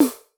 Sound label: Snare, Yamaha RY20